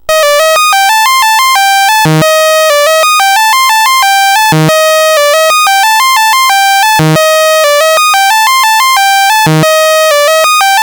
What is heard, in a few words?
BASIC sid CSG8580R5; hvsc